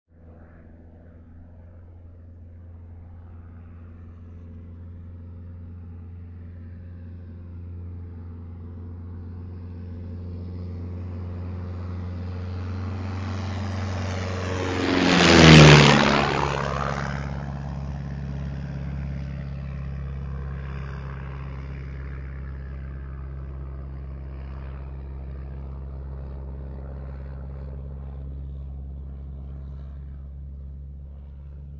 Plane Tigermoth Fast By

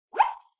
Wind Whistle Zip

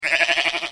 Sheep Sheep

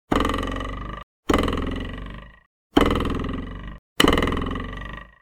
Cartoon Boing; Cgeffex

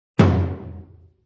Low Tom Hit

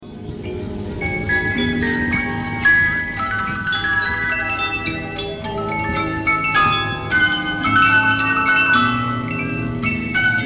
Regina Table Model Disc Music Box; Microscopia

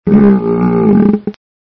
wolfman

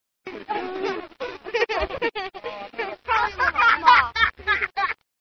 giggling kid

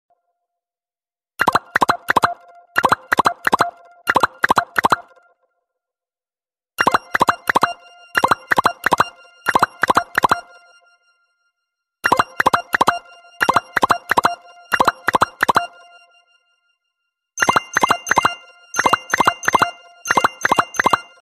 Pretty Alarm Sound

